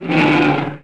roar; The Elder Scrolls III - Morrowind

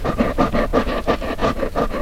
wolf pant; The Elder Scrolls III - Morrowind; were

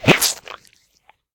Ooze Attack; SyrinScape

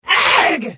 Hit; The Elder Scrolls III - Morrowind

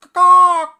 Ka Kah bird; SyrinScape; Syrinscape unplugged; Jungleplanet dinolaser battle

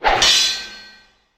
Scythe Clash Sound Sword; SyrinScape; Library Depths

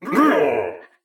Combat; Eshimal; SyrinScape; Snarl and Oraculum

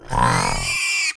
roar; The Elder Scrolls III - Morrowind

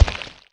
sludge; The Elder Scrolls III - Morrowind

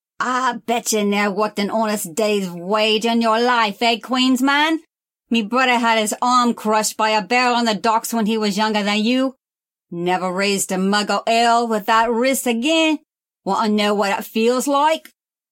mob speaker processed, city gone mad; SyrinScape

